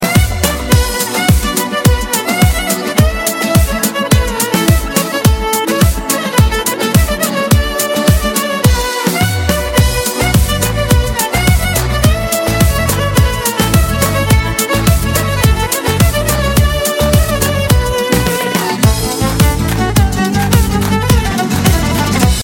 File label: instrumental ringtone